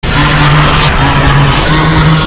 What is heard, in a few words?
toilet; super soldier